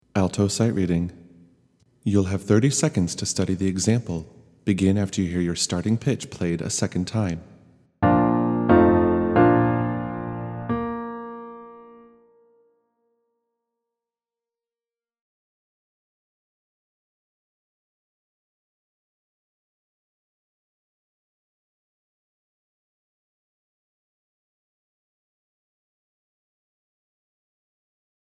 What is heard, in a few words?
Alto in Bb; Sight-Reading